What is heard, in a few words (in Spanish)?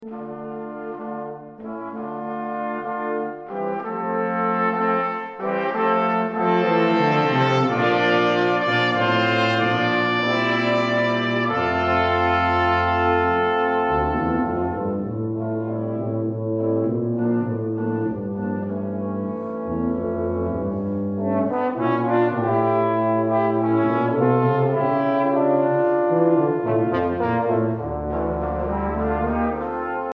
Zamba Gaucha; Suite Americana IV